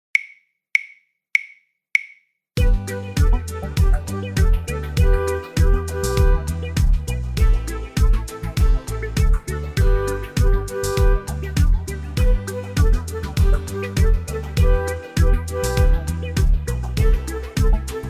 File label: Disco Duet Full Performance